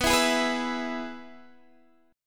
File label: E Major B